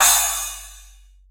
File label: crash cymbal g sharp key